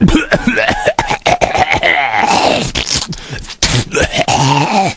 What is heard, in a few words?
barf